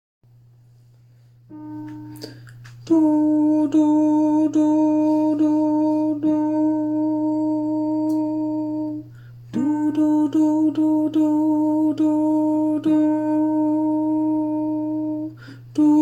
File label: alto harmony part